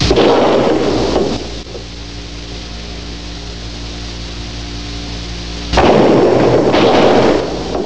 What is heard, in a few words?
gunshots; Jfk conspiracy